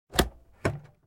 door open; blindside

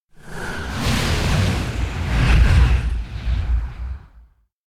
Whoosh Hovering Wind Air Movement Long; Hollywood Edge; sound designer's toolkit v2

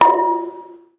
Baseball Hit Metal Pole

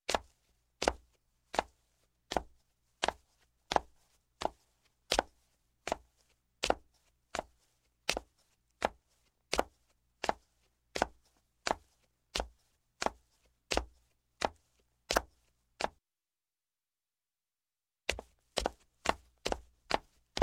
Footsteps, Wood Down Wooden Steps Male, Slow; Sound Ideas 2000 Series

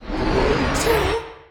Punch Left Speed Up; Pitch Black - A Detective's Demise

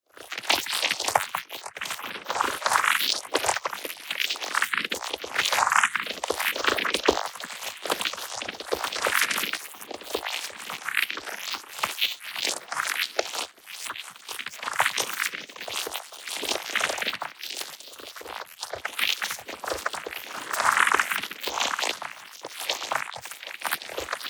TEXTURE Squelchy Lifeform; boom library; Matter

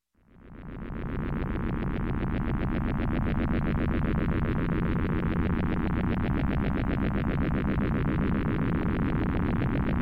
Space, Ship Space Ship, Idle, Electronic; Sound Ideas 2000 Series

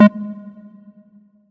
Collide With Wall; Pitch Black Audio Pong

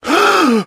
gasp male; Pitch Black - A Detective's Demise

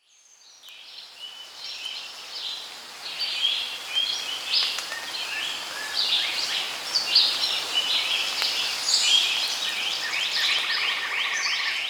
Monkey Crowd; Pitch Black Audio Pong

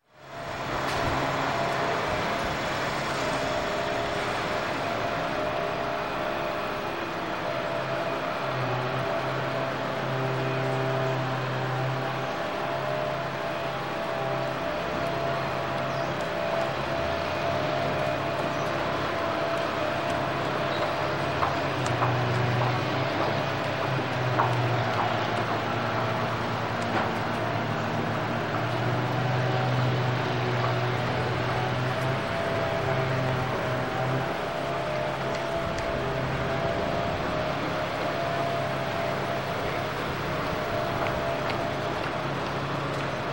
Construction, Ambience General Ambience Heavy M; Heavy Machinery; Sound Ideas 2000 Series

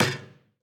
Street Ball Metal Slap; SFX Kit

